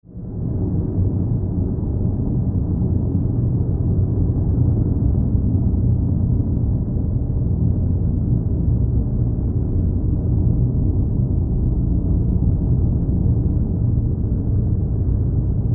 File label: scifi atmo